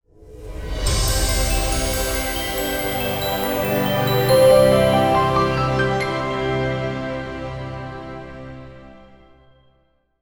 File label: BGM LevelClearance Calculation; Wenjia